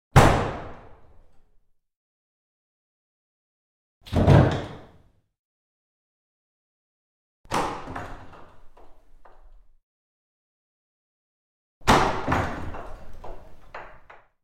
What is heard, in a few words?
Wooden Vestry Door Opens & C; Hollywood Edge Historical Series; cd4 Castles Palaces & Monasteries